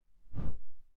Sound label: Candle Blow; Phasmophobia